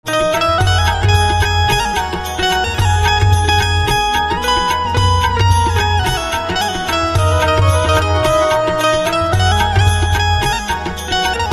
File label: Banjo; devotional instrumental ringtone